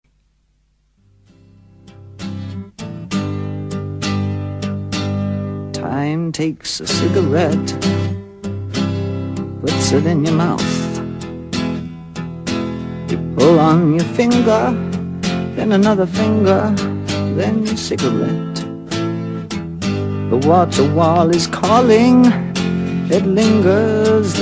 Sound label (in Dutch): Akoestisch; Akoestisch of electrisch